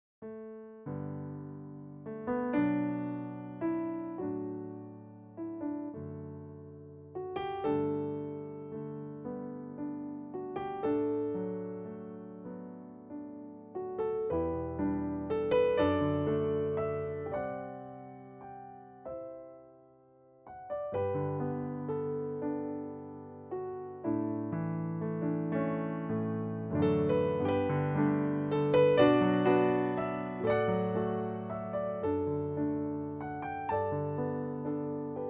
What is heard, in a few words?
Piano Solo